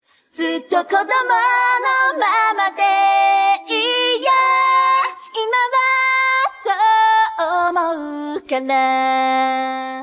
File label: vocal